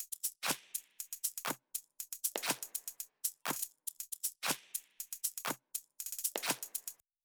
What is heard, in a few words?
percus